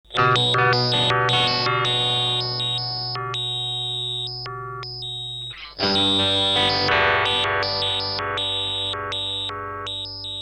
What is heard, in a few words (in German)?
Ring Mod mit Step Sequenzer; Red Panda Radius